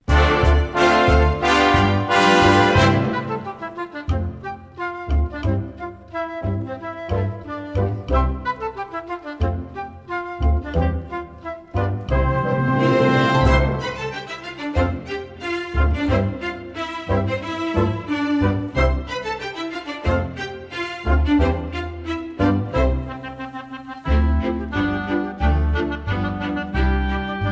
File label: Backing